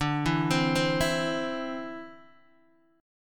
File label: D 7th Suspended 2nd down Guitar DADGAD; D7sus2; arpeggiated